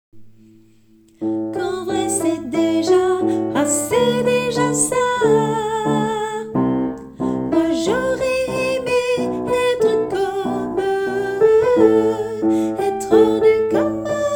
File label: soprano